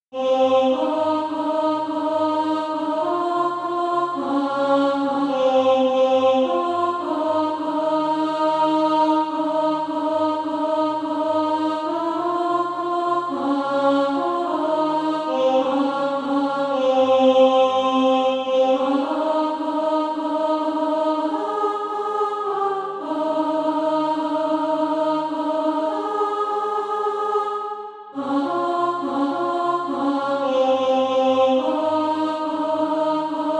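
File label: alto, Practise Music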